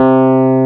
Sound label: C2, Piano, ELECTRIC PF; AKAI S6000 CD-ROM - Volume 1